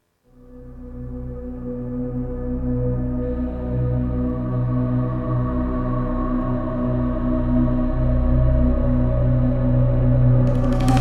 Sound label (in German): Entspannungszeit Schamanische Meditation